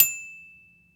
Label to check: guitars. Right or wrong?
Wrong